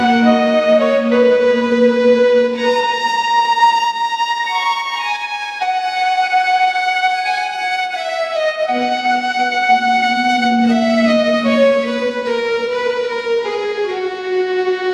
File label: romantic opera serenade